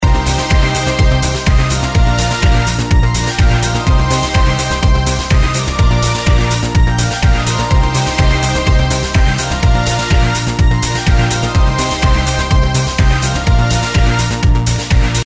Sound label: bgm; lucky7 loading